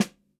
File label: Snr, drum